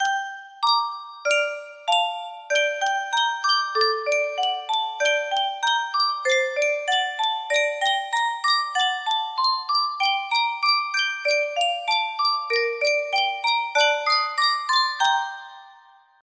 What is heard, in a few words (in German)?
Yunsheng Custom Tune Spieluhr